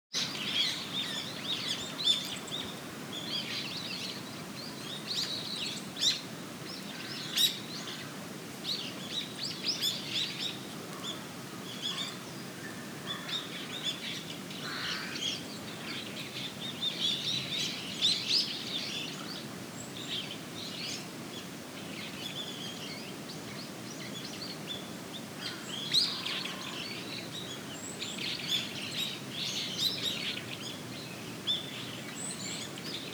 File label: birds